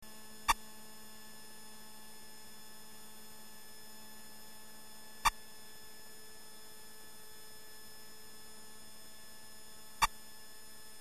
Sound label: radar